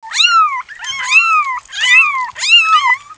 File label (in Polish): kociaki